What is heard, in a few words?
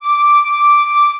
flute